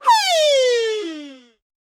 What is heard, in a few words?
party